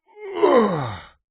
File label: male voice groan relief after lifting heavy weight; zapsplat